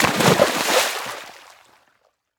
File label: splash; Scrat